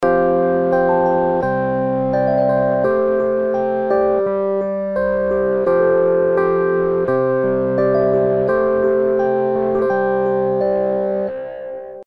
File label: Fuzz; Arturia Stage 73 V2; Dist OPAMP 21; Fuzz Me